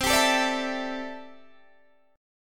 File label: C 6th Add 9th C down Guitar Open D